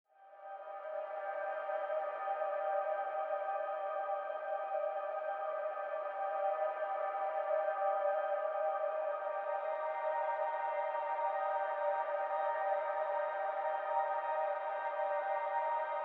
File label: Synth; raji an ancient epic